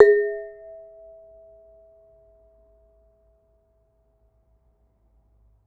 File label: Bonang, Gamelan